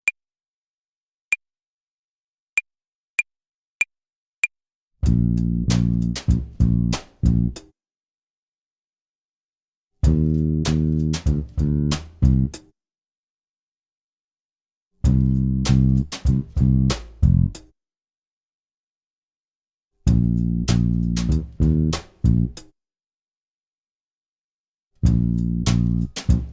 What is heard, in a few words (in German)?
Tempo und Rhythmus Übung 3 und 4 HiHat, Play along